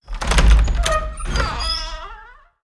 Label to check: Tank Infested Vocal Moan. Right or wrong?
Wrong